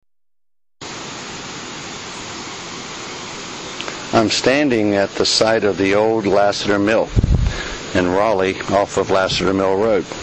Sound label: mill soundscape; lassiter